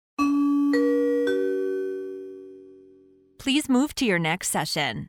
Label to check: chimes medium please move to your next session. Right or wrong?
Right